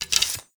Hunter Bow Reload; Weapons; Enemy; Bows

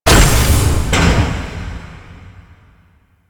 Taller Long Door Close; Warframe; Ambience; Entrati

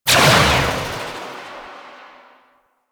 Fully Charged Shot, Weapons; Prisma Angstrum

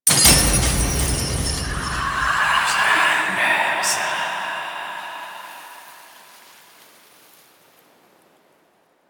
Chain Break Final; Warframe; Harrow; Red Veil Temple

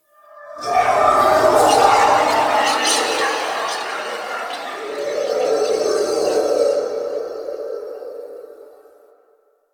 Tower Whisper; Warframe; Quests; TheNewWar; Ambience; Cetus; Unum Tower

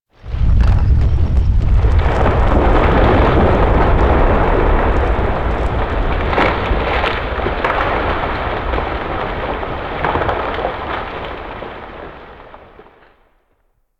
Mountain Pass Mini Avalanche Early Start; Warframe; Ambience; The War Within

